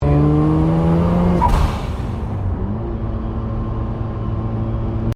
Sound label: CAR MAZDA RX7 SERIES3 INTERIOR GEAR CHANGE TURBO SOUND; 1983; Cars (Sound Effects:Vehicles)